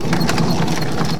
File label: Roll Cannon Wood Deck; SFX Kit; Foley - Misc